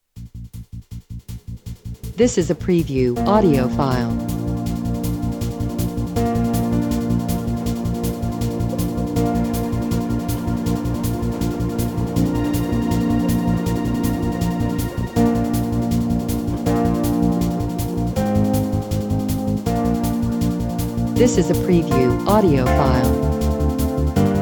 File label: Backing Track